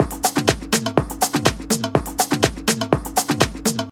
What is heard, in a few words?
house top loop fill; iberic; drum loops